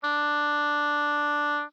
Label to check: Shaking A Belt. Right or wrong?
Wrong